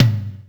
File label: Toms, Real